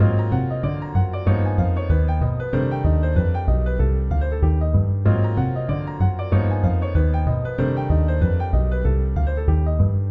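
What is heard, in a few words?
voicings with bass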